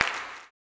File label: CLAP, blues & soul; ILIO; Vocal Planet